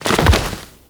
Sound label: Roll Forward Foley B; Enemies; Warframe; Teshin; DE